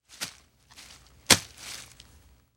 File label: Grass And Mud Dig With Hands Short; Foley